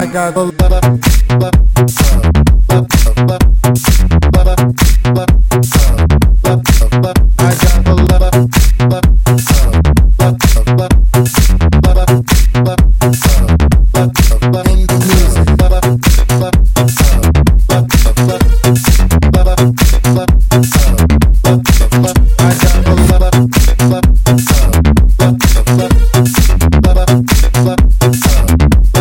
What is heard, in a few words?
swing in the music remix